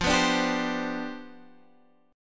C Major 7th Suspended 2nd Suspended 4th F, chord

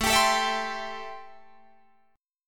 A-Minor 7th A Guitar Drop D

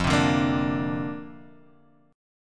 F 6th Suspended 4th F, chord; F64 strummed

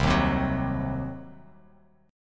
C Diminished C, Bass Standard; strummed; JGuitar

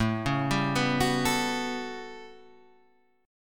A 13th, Guitar Standard, chord; arpeggiated

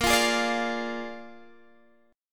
Bb 7th Bb Guitar Half Step Down, chord

